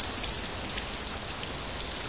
Fountain; 暴力赛车

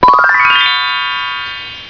Joy, happymusic; 特酷声音素材库; 背景音乐

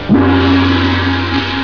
Joy, happymusic; 特酷声音素材库; 背景音乐

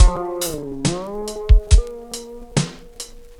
Completebeats 82

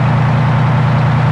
Normal car Idle; 暴力赛车

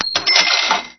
Money; 暴力赛车

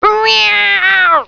CAT, 猫; 动物; 家畜; 特酷声音素材库

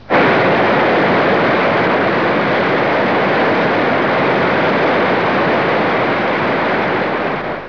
Water, 水声; 特酷声音素材库

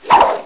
Fight; 特酷声音素材库

